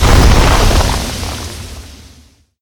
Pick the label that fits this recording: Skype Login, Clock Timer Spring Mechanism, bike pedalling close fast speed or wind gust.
wind gust